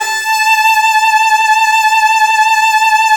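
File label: violin, Virtuoso Strings, SOLO VN, A4; ILIO; Kirk Hunter Virtuoso Strings VOL-1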